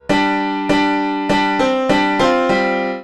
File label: short melody example